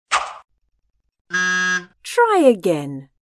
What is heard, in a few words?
wrong shoot